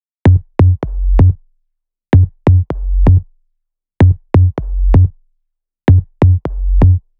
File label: Melo 128BPM; Mini Kits